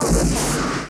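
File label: laser gun; Total Control - Action Cutz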